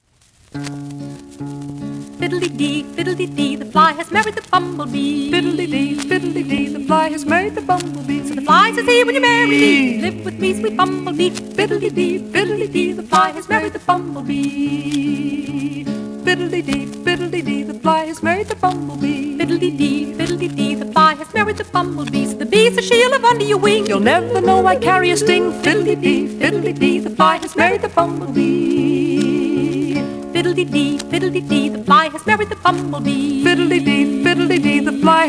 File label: Fiddle